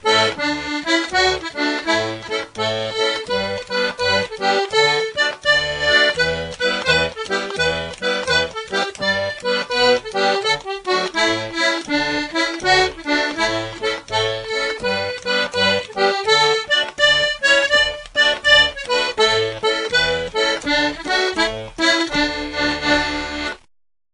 melodeon

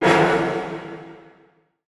snare all wet mirror

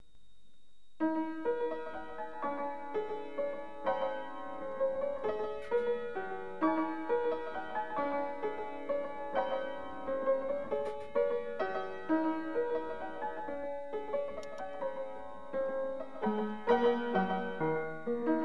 PIANO

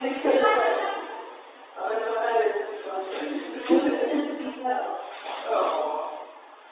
laughing then voice

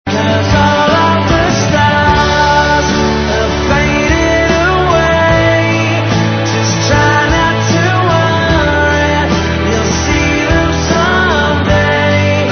Crying, ringtones

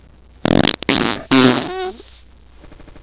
fart

